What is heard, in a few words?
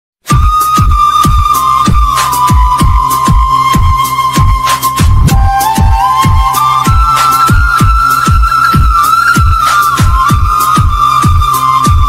independence day bgm ringtone